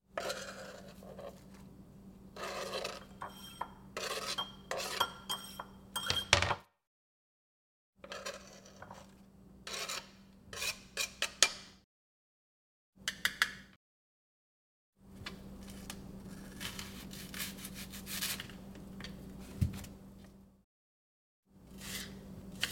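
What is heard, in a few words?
kitchen sound of cutting board chopping food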